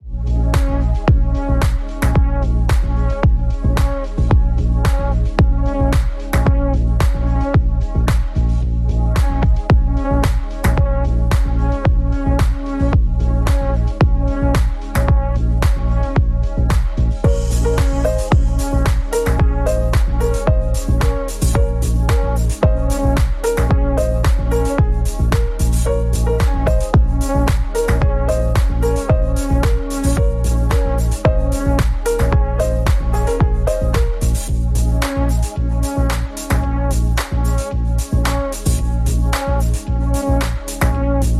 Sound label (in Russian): muzyka v mashinu bass boosted